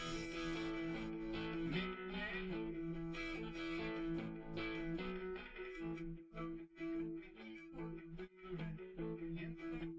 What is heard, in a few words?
drums, live rec